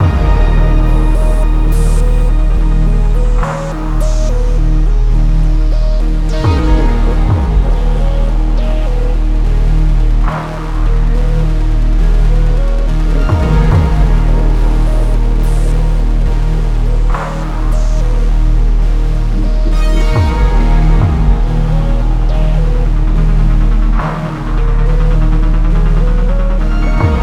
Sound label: PRE BOSS FIGHT LOOP; high hell